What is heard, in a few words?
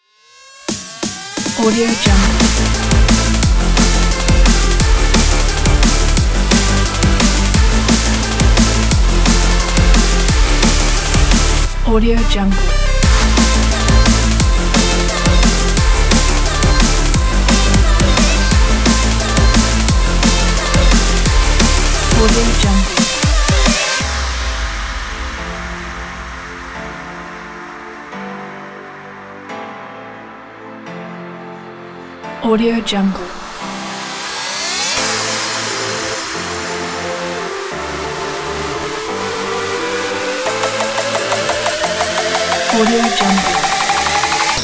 high speed patrol